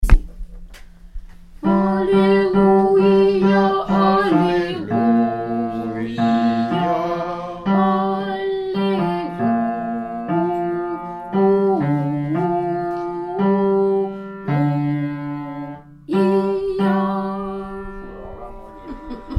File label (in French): Alleluia Ton6 Basse; Etude des tons liturgiques slaves; Voix basse